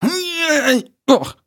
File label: voice male critical